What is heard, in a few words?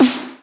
snares; Vengeance Samples